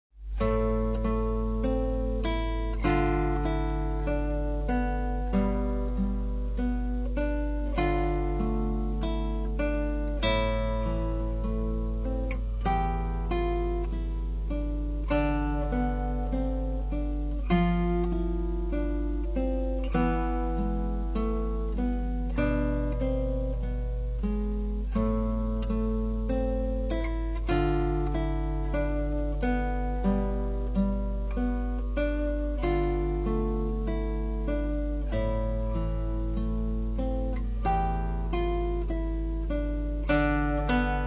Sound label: Easy piece For Classical Guitar